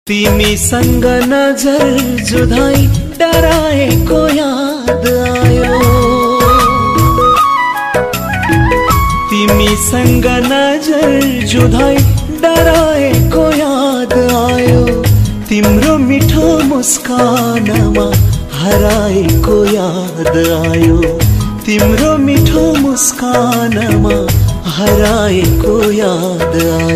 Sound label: sad nepali ringtone